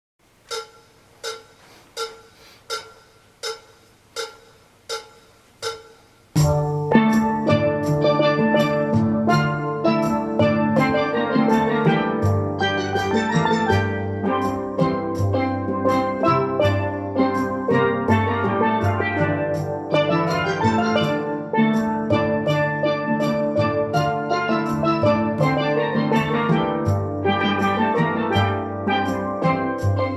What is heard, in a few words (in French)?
TUTTI Instrus Couplets refrains